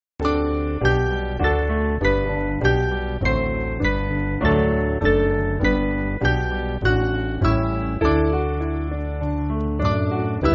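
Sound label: Piano, church backing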